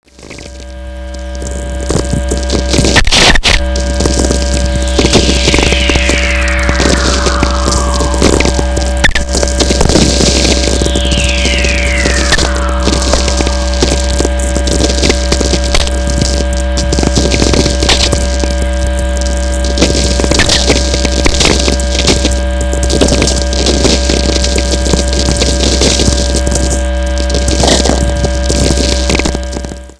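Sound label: whistler; Natural VLF Radio Emissions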